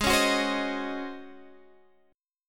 C sharp Major 7th Suspended 2nd Suspended 4th

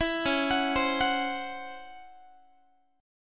Db Minor Major 11th Db down Guitar; arpeggiated; 19,21,21,22,22,x; 4A,4E,5A,5D,5G#,6C#; DbmM11

